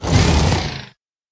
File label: drill Out; dwaarp